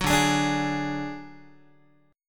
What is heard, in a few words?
D Major Flat 5th D Guitar, chord